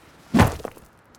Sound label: miner hit; jobs